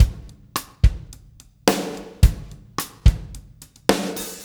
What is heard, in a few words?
PULL STIK, Backbeat, PULL UP; Spectrasonics